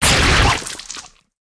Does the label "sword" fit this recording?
Yes